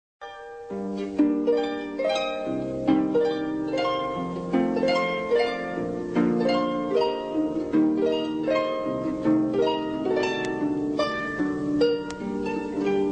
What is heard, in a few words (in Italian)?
intervallo rai anni 70